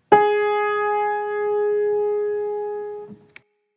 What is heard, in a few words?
sop ii ab